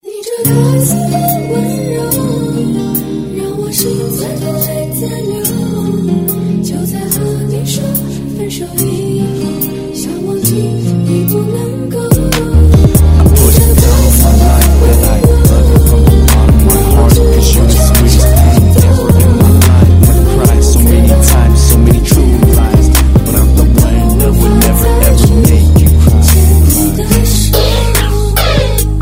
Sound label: Chinese Love Song